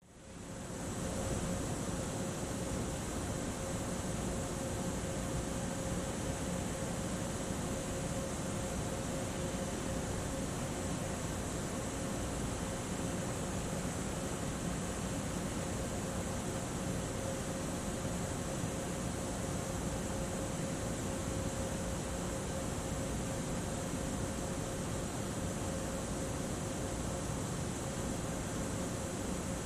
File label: Train Station Grand